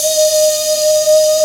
VOI VOICE; Sound & Vision - Gigapack I CD 2

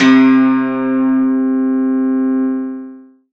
eth, Ethnic Synths

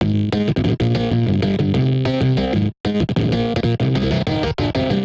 Phase Plant, bass

